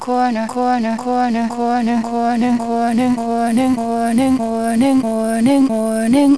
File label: Auto Corner Morning